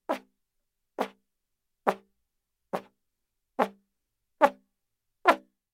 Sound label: slap